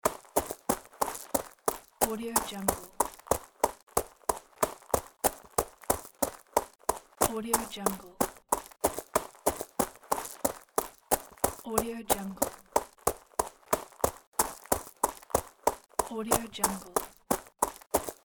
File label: footsteps running on small rocks; audio-therapy